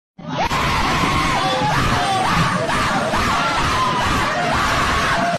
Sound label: nooooo meme; میم طنز نههههههههههه